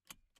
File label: seatbelt off